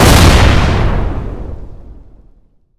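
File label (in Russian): оглушительный близкий взрыв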